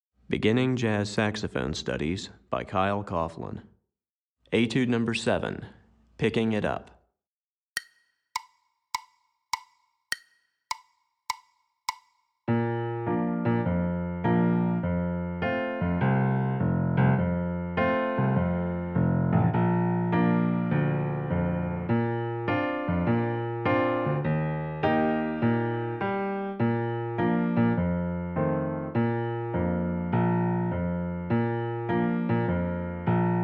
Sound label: Piano Part, Beginning Jazz Saxophone Studies Alto